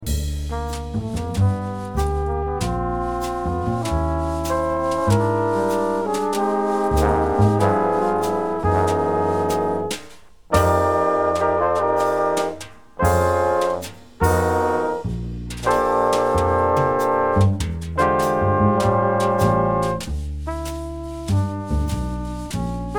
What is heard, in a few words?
minus MEL SOLO